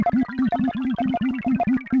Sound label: Score Jauge Time